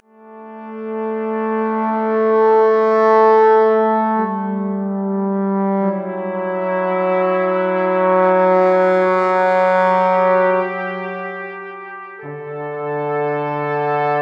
Brass C; Camp D075 Brass C

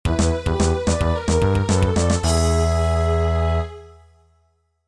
Victory; Spider-Man vs Doc Ock

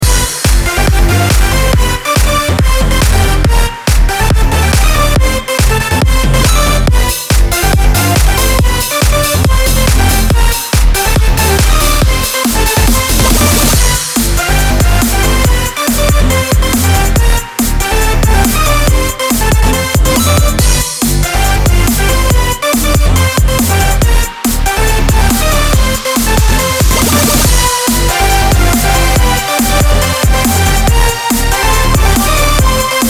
Boss Stage

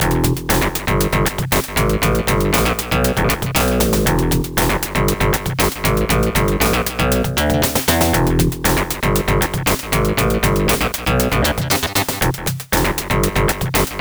Menu Music; NBA Live 98; Genesis

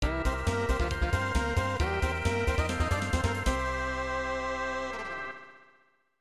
Store Clear; pachi slot kenkyuu; snes

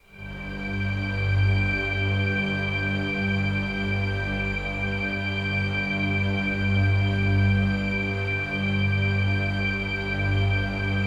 Loop Strings Stem; ps4 ps5 switch windows xbox one xbox series xs

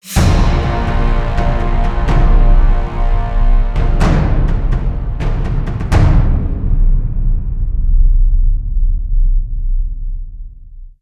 Combat Intro

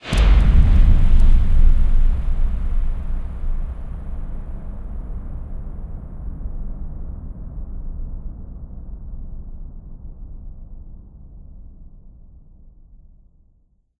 Stinger; FlashbackHope3; Flashback Sfx

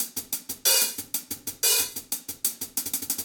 hihat

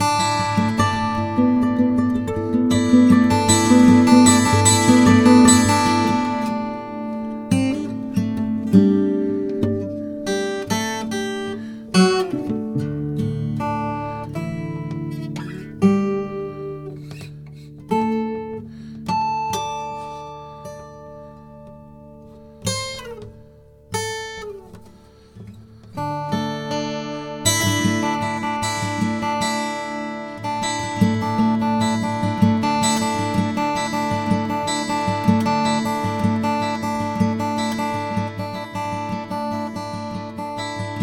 Guitar E; Camp; Torchlight III; PS4

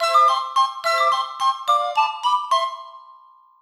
Level Complete; Gabbuchi; ps4 switch windows; Medium

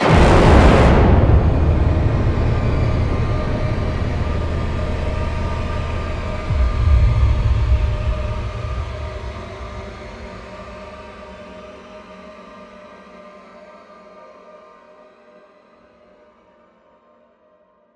Impact; at dead of night windows gamerip 2020

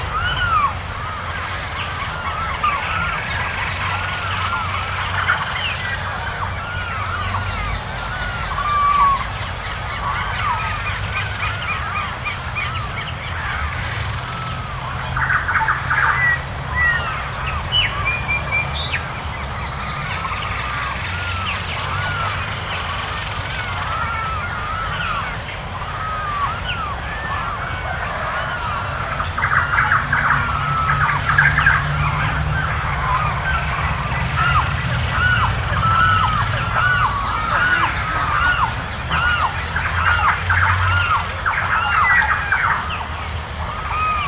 Airfield Chase Ambience

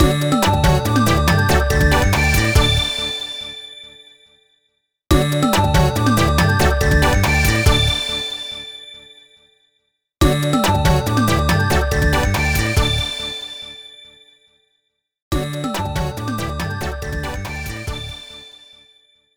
Microgame Success; Gremlins Gizmo; wii

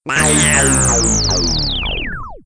Failed

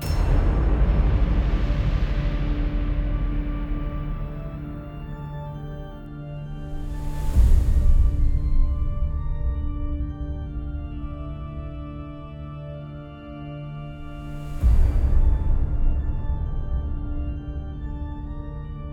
Mood Tension Light

